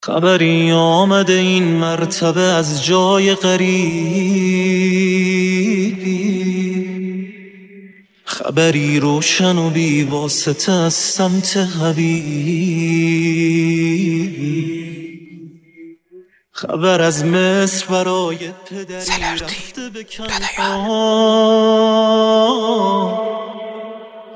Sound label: Vocals